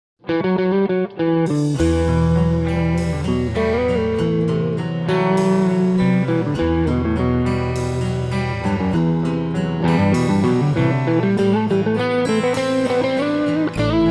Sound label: Gibson R7 Organic Stigma Set